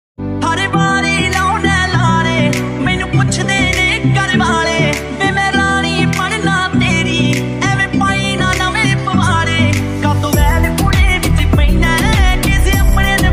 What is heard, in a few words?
panjabi ringtone